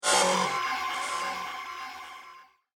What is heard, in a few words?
Metal Laser Tower Zap, laser gun; science fiction sound effect; star wars / trek style games